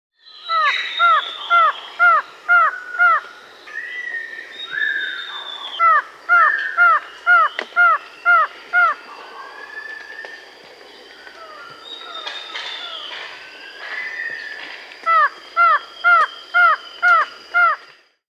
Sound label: White-shouldered Antshrike (Thamnophilus aethiops), Peru; S. America